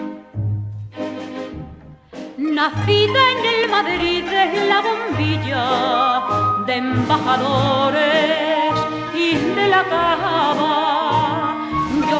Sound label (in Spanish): Chotis; Rosa de Madrid